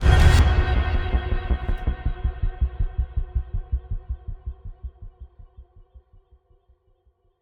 Music Combat Success; Batman Arkham Knight gamerip

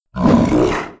attack bloater

